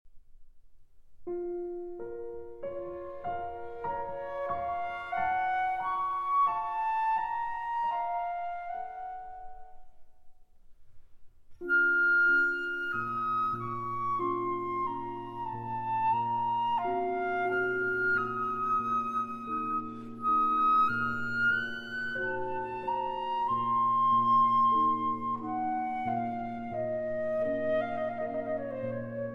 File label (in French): Sonate Pour Flûte et Piano, Cantilena